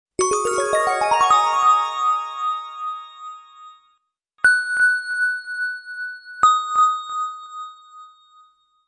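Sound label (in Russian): музыкальный перезвон композиция; Перезвон колокольчиков создаёт волшебные звуки; Взмах волшебной палочки, сказочные звуки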